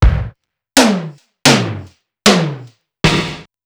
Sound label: Simmons SDS2000